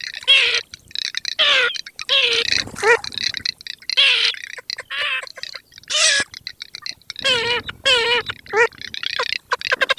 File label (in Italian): MARZAIOLE